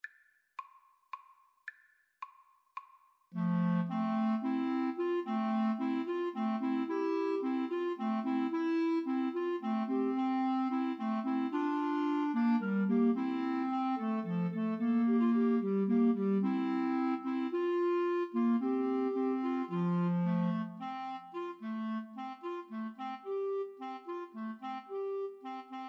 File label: Clarinet Trio